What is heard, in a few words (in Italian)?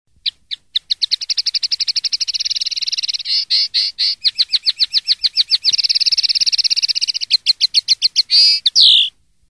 Fanello, canto